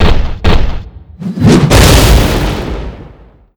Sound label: Iron Golem Skill; Seer; GamigoNA